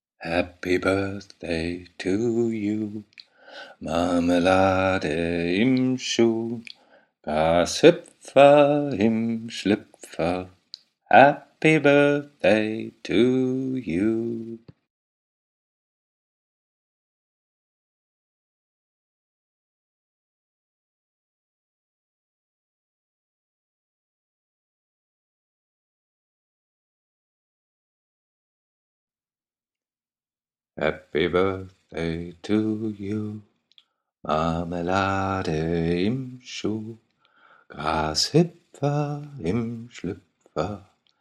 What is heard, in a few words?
Happy Birthday Song